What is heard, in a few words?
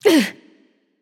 grunt hit; smite; themorrigan